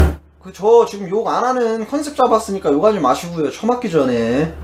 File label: scout positive vocalization